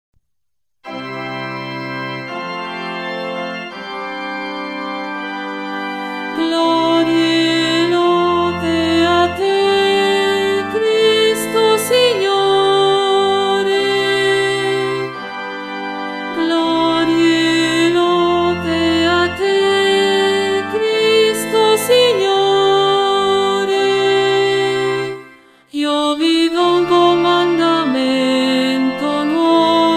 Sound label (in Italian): voce; ALL'UNISONO; Voci; studio canti